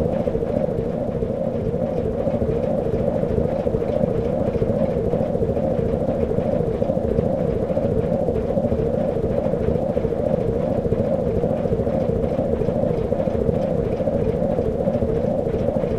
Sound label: Deathrest Accelerator Working Loop; rimworld